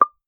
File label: cute dating app notificat